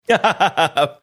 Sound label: chuckling